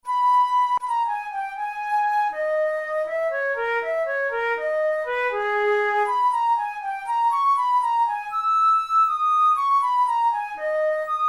flute studies in g sharp minor; dothel

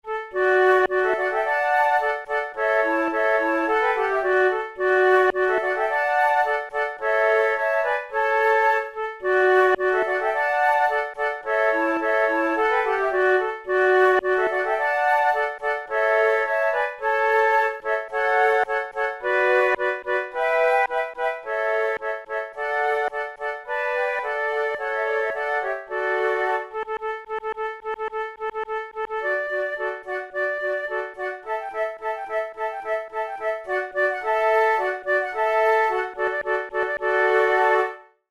trio; weber; der freischutz; the hunters chorus

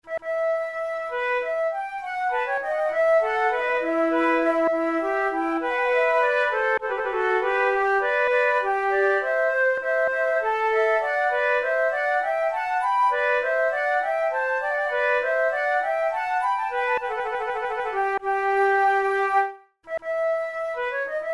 concerts for two flutes courante; monteclair; a litalienne

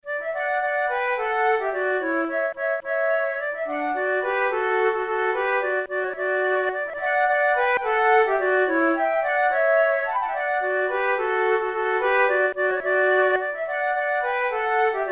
duet, flute

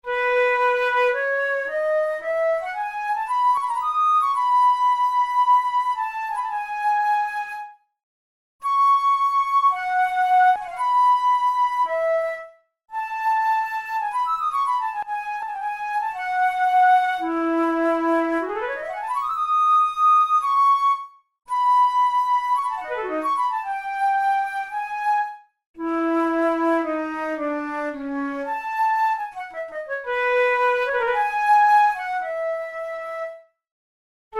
studies for the boehm flute; drouet 72

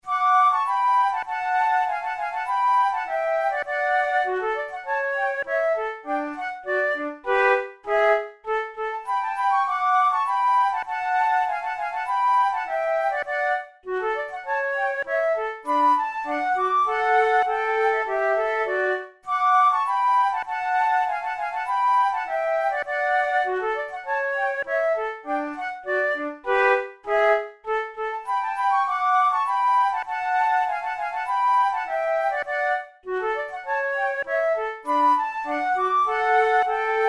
methode de flute; tulou

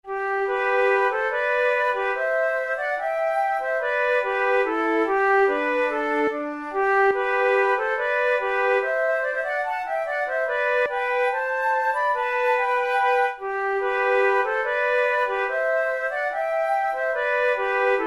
duet

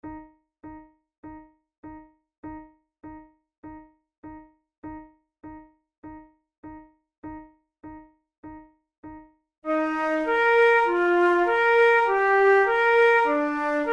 methode de flute; tulou

